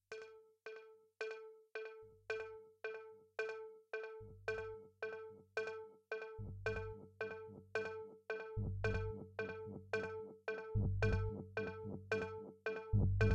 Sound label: experiments with minimal glitch; weeklybeats-2014